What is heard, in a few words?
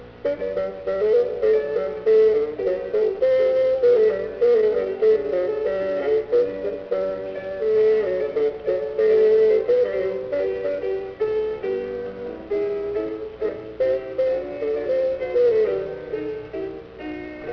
bassoon